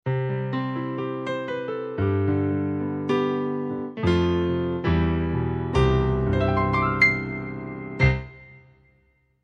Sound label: Stereo Piano; KORG TR